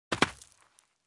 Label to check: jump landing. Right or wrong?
Right